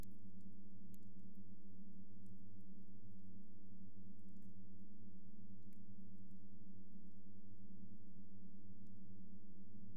Porichthys notatus Hum

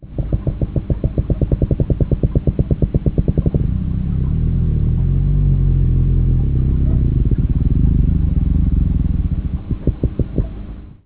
Melanogrammus aeglefinus Hum

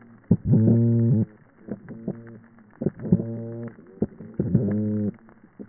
Amphichthys cryptocentrus Boop Grunt

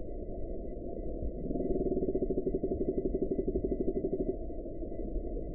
Ophidion rochei Snap

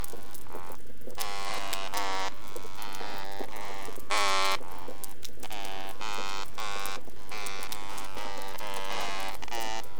Chorus; Sanjiao

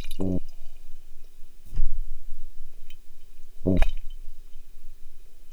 Pygocentrus cariba Drum

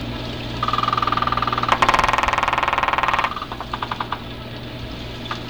Ophidion marginatum Jackhammer

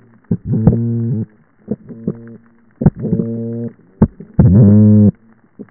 Amphichthys cryptocentrus Boop Grunt

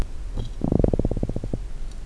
Pseudotropheus zebra Growl

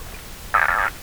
Salvelinus alpinus

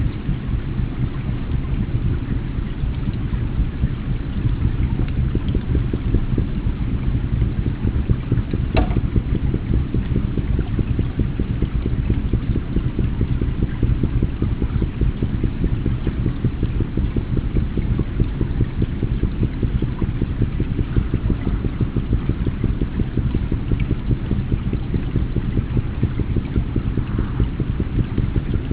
Melanogrammus aeglefinus Rumble

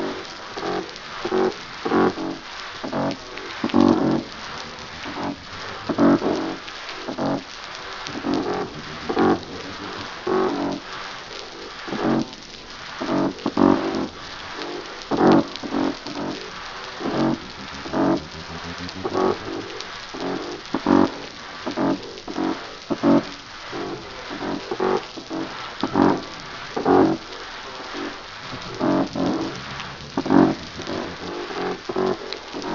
Protonibea diacanthus Chorus

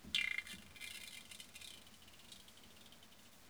Clupea pallasii

